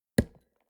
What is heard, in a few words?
lumber tree